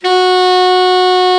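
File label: alto, sax; Best Service Gigapack 1; BR SAX 1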